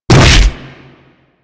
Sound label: Body Check A; Warframe; Grineer